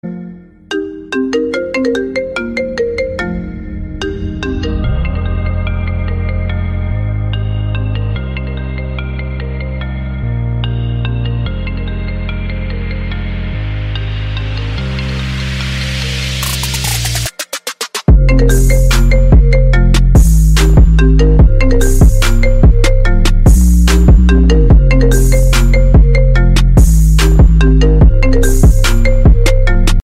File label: epic; iphone